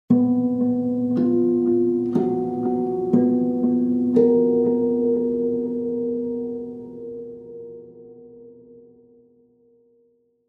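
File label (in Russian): звук догадки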